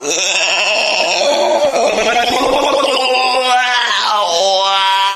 demonic goat howl

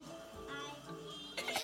peeing

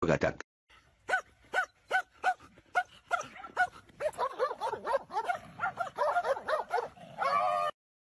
many dogs barking

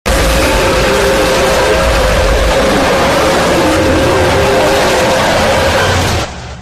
angry

